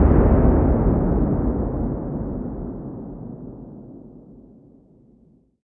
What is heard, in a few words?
plane; World of Tanks